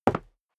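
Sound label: step sneaker concrete single left, footsteps; schritte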